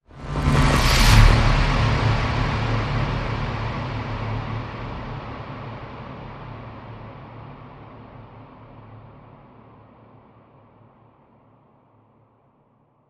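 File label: Whoosh Electric Surge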